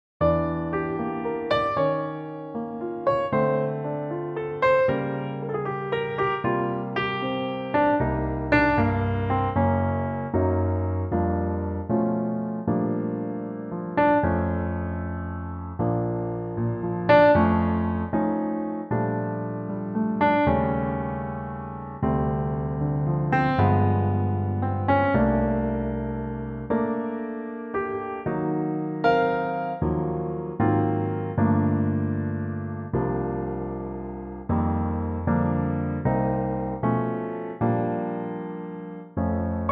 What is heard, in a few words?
Bb Piano